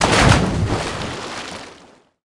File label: water splash large; body; physics